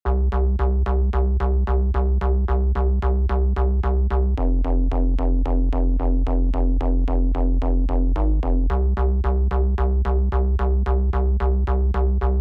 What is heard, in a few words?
Low Bass; retro 80s synth wave; retro 80s synth wave presets abx3 vol1